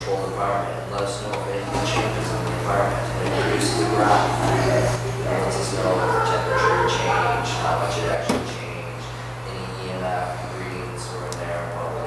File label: children singing